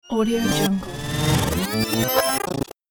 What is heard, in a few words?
glitch future